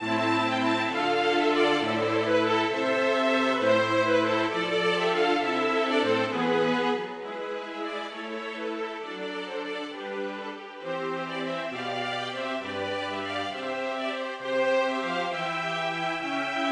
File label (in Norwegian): Gigue for strykekvartett uten cembalo; Hertz